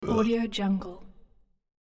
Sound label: cartoon character fat blurb